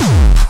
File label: bombo, kicks, Hardcore